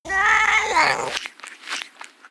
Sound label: Pharaoh Cat, Play